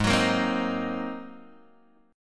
G sharp 9th, Guitar, Open G; down; chord shape

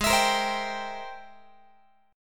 G sharp Augmented Major 9th G sharp Guitar; G#+M9 strummed; JGuitar

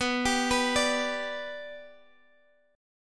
G Major B, chord

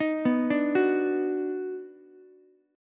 Eb Minor Major 7th Sharp 5th, down, Ukulele; arpeggiated; chord; JGuitar

